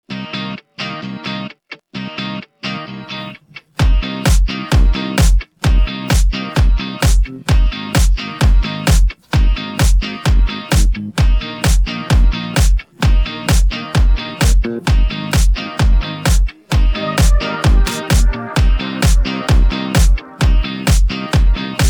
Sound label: instrumental; persian